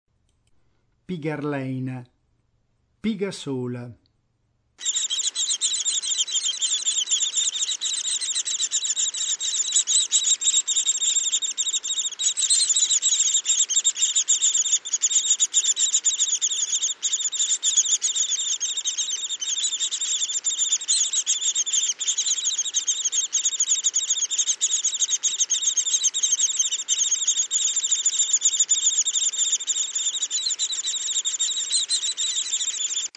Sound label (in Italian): Picchio rosso minore